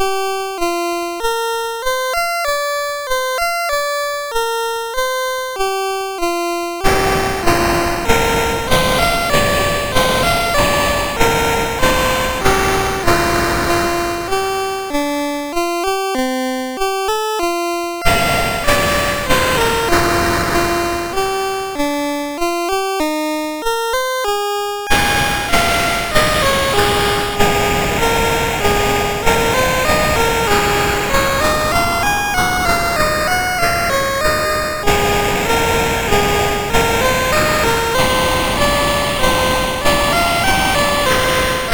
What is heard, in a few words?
sid, MOS6581R4; Cambodia Raid